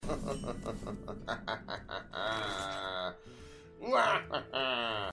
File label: muhaha